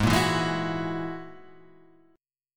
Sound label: G sharp 7th Suspended 2nd Sharp 5th; G#7sus2#5; strummed; x,11,8,9,8,11,8,x; JGuitar